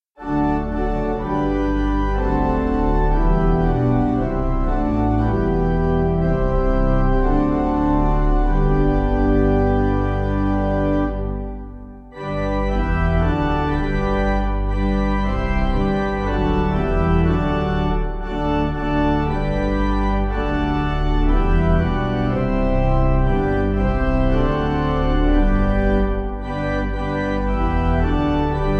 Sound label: Pipe